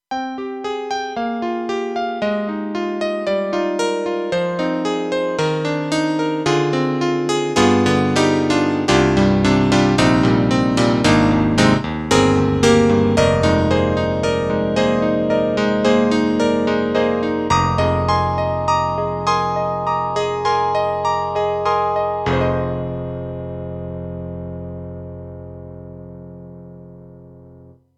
KORG M3 XPanded CP Electric Grand

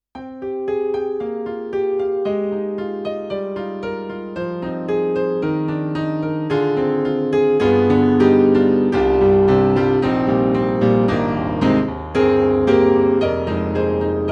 Yamaha S80 + PLG-150PF

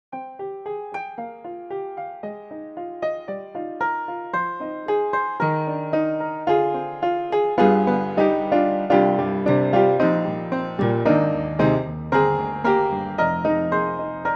Upright Piano; IK Multimedia

